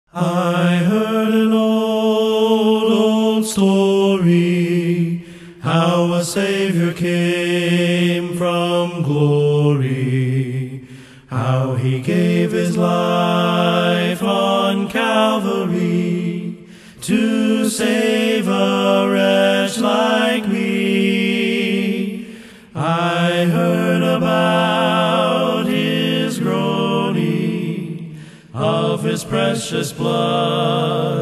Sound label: A Cappella